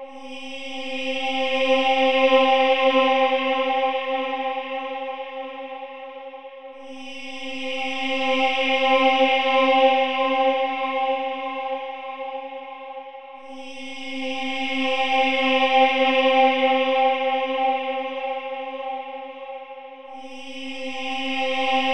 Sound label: music